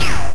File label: GUN; ARRAKIS